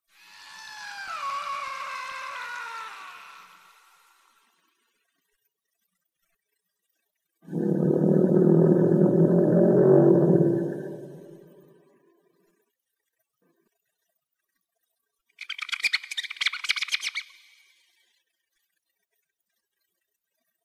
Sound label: krypt ambience